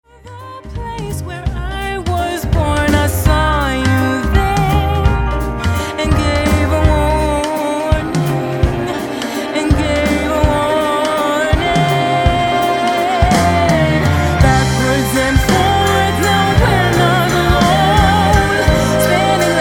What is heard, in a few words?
String Scoring Drums Percussion; Organ